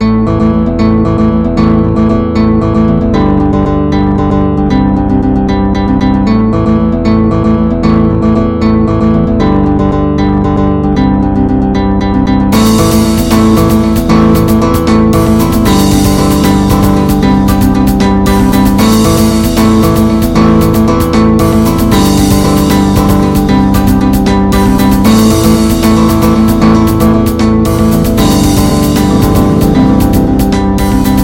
rythm single

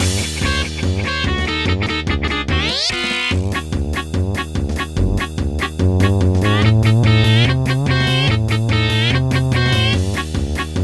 Theme Instrumental

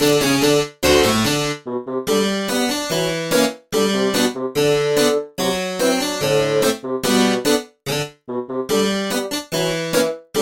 Bassoon

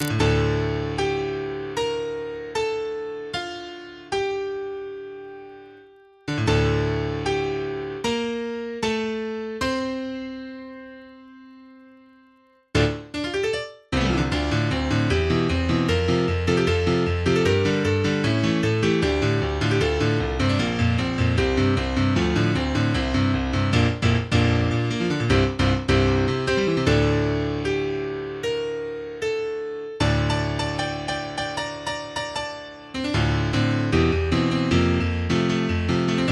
Chord

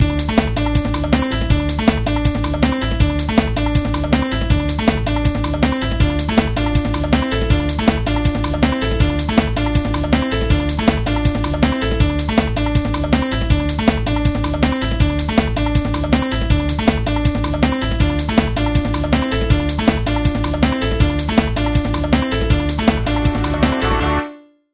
A Bit Jazzy

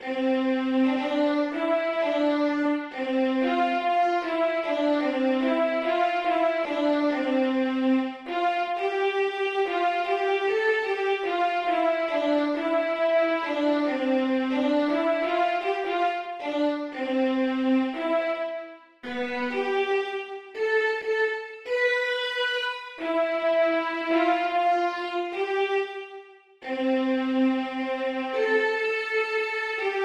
Dramatic Movie

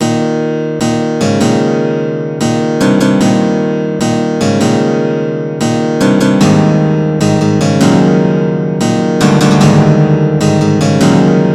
guitar perebor

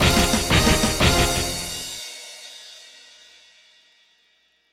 Jingle; Tiger Woods PGA Tour 2004 (Game Boy Advance)

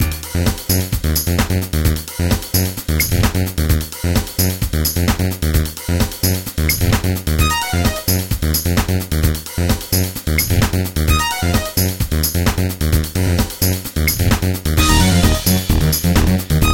Techno

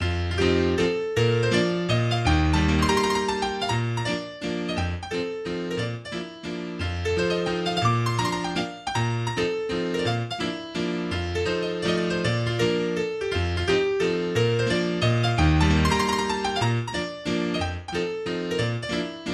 in B Flat Major Vivace; Mazurka No 5; Opus 7 No1

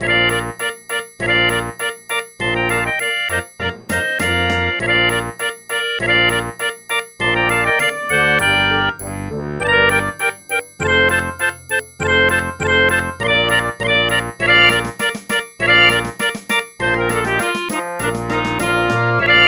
Turkish March

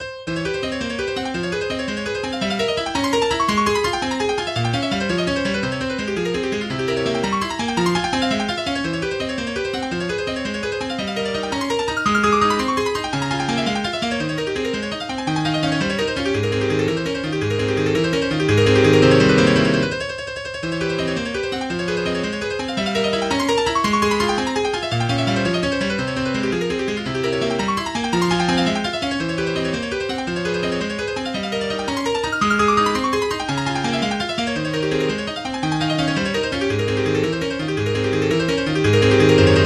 Etude in F Minor Presto; Etude No14; Opus 25 No2

